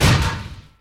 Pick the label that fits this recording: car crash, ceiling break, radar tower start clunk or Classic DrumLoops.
car crash